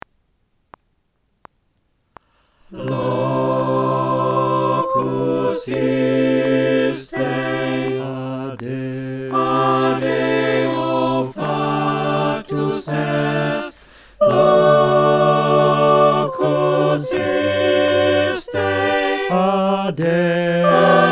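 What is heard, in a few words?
soprano alto tenor bass, choir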